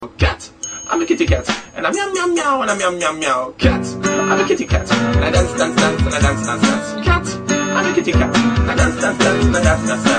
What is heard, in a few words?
kitty cat song; animals